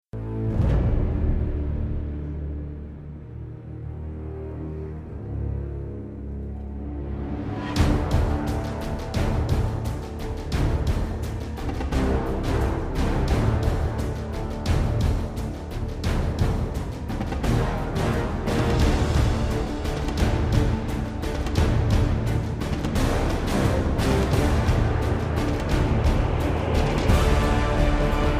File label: music; pirates; at worlds end; flagsmith